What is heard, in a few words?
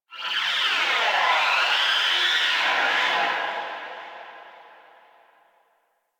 Ship Energy Wind Texture; Ambience; Warframe; Corpus Ship Remaster